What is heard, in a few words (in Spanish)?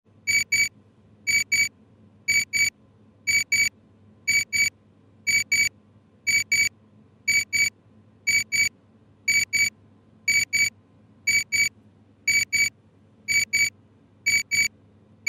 alarma reloj